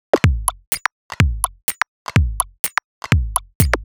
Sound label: Top C 125bpm, House Drumloops